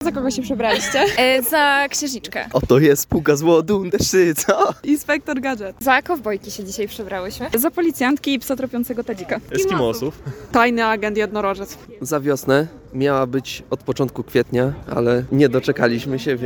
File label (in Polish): Korowód